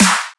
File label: Snare Old School; pitchglitch